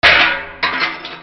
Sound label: FALLING